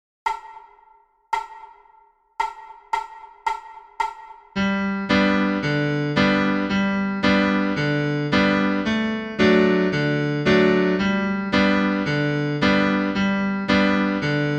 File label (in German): Guten Morgen schnell nur Begleitung